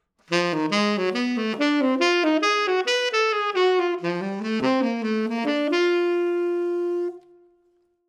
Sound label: metal ebonite, saxophone mouthpiece